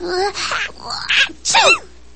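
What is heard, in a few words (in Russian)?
чихание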